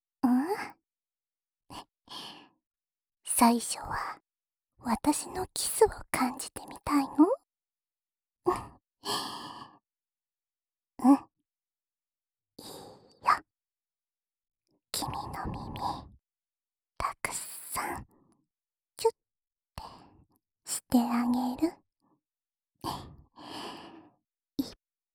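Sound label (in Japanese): 優しいキスで、幸せになってね?（浅い右の耳舐め)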